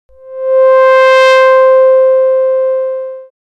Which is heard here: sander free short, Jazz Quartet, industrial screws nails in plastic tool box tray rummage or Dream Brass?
Dream Brass